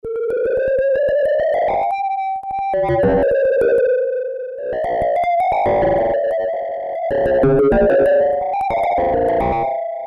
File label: Time Bells; Alesis airSynth